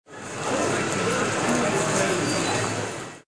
Amusement Park; CityBackgrounds

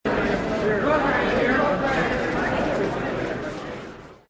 Noisy Crowd; VoiceBackgrounds

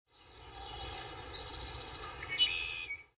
Forest, Nature Backgrounds

